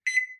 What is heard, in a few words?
Microwave Beep; Phasmophobia